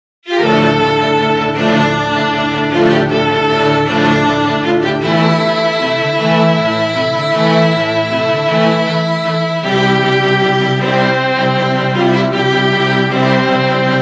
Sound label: Quartet